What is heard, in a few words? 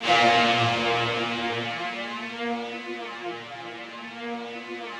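Rough Strings A#1, Yamaha VP-1; 90 s Sample CDs; Music Store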